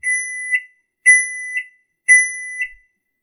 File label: Microwave Finish Beeping; soundscrate